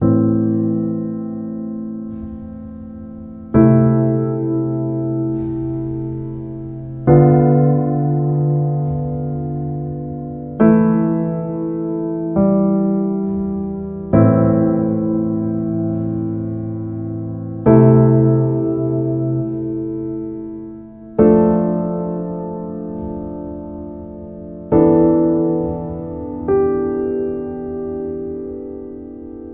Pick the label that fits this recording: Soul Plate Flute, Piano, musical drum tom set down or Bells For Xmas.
Piano